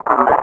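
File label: droid sentry attack; Star Wars Knights Of The Old Republic I; XBOX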